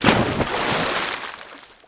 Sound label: splash; Splashdown; XBOX